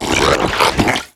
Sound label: puke start; pestilence